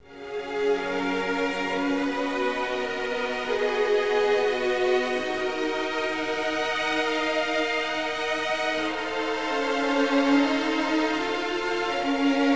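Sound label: lo string open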